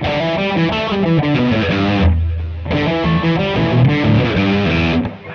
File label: 90 Verse Rock E; Loops